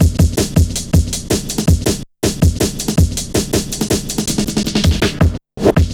Drum Stepz, Drum Loops 161.5; Zero-G Jungle Warfare 1, 2 & 3

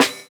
Snare, jungle breaks drum sounds

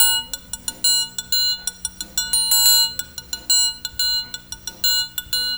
TRIANG; East West; Funky Ass Loops

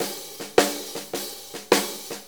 Blues Loop, Drum Tools, Full Kit Loops

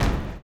STOMPER; Blues Soul; STOMPS; Spectrasonics Vocal Planet

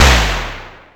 TOM BOMB 2; Greytsounds; Scott Peers Sound Engineering

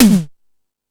Fusion Stix, E-MU Pro-Cussion

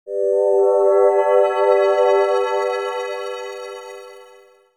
Digi Pads; Jungle Frenzy 1

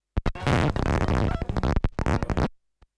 Turntablism; Sonokinetic - The Roots Drumloops

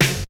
Snare; ZERO G - Datafile DISC 1

